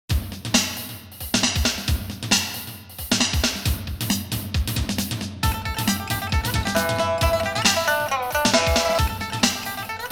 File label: China Thunder 135 bpm